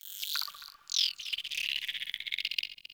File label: Venus Landscape Close Texture, Ambience; Warframe; Venus Open World